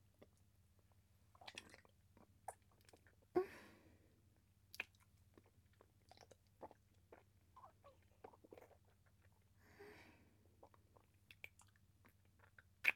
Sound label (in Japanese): 妻との和解耳かき, ASMR